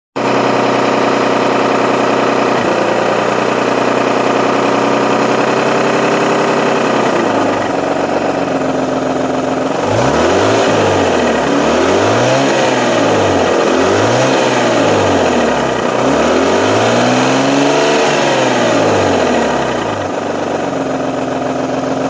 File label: bad knock